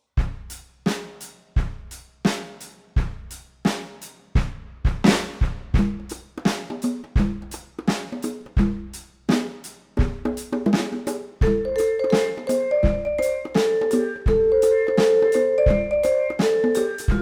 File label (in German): Percussionensemble